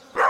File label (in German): Hund bellen; Stardew Valley